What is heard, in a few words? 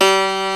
STENWAY; East West; Global Sounds